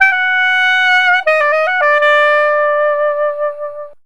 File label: SAX, Balkanish Traditions